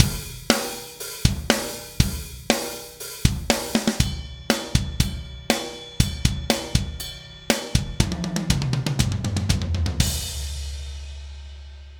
buss batterie nuke mode; lindell 354e

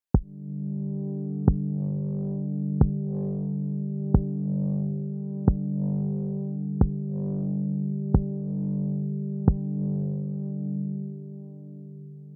enveloppe generator; saturn 2

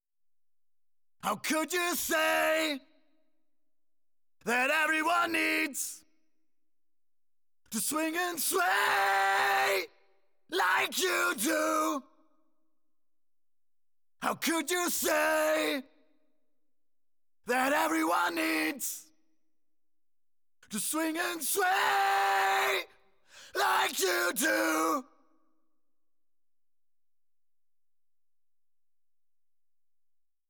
novatron vocals avec effet sans saturation

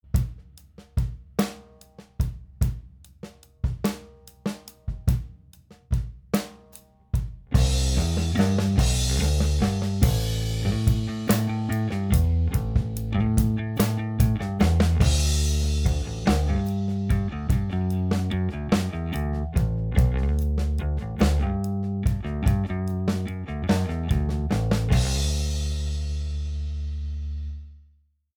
mix avec focusrite EQ only

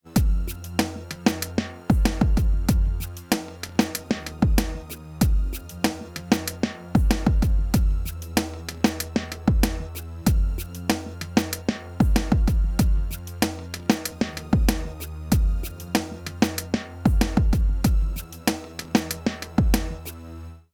beat avec overeasy ratio5 atk normale

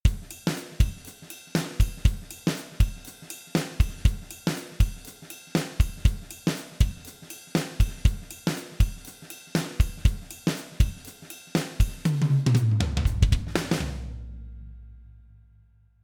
batterie avec comp670; london

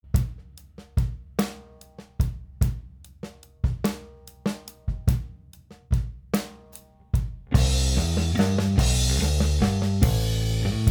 mix avec Focusrite SC EQ plus comp

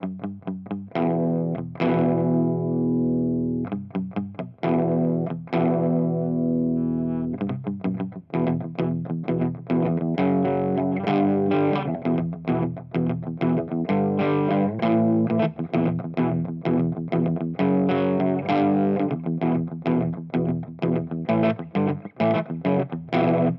guitare sans effet; heavypedal

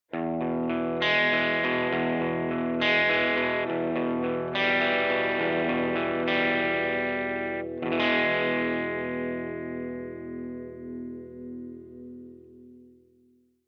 simulation baffle 1x12 fender

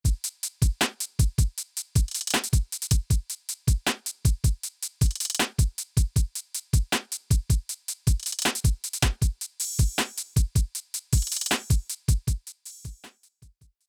beat avec limiteur

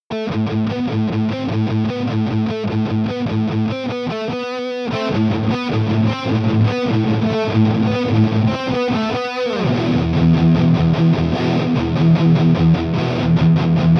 disto; euphoria

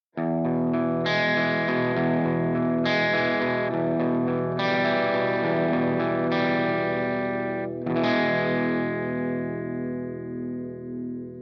simulation baffle 4x12 Orange

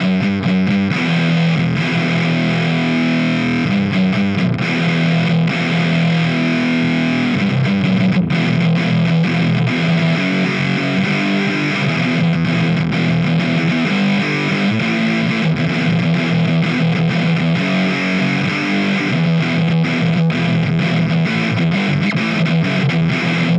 guitare avec swede; heavypedal

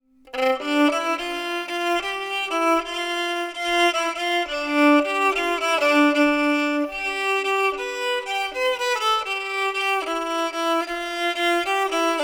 violon air reverbe

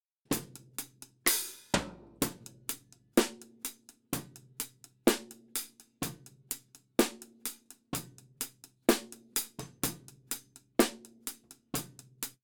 mixage cymbales seules avec effet

